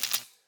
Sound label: light gas spark; Escape from Tarkov